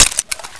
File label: box out, Reload